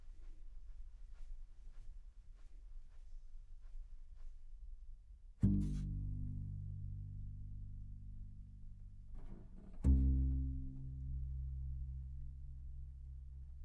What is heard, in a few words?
C Bass